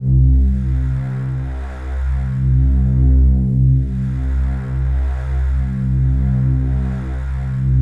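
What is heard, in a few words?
chord pad, Pads; Best Service; XX Large - Pads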